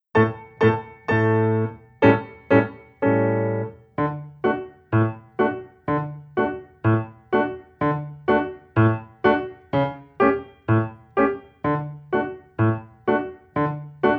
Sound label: playing pizzicato